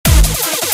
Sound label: Dubstep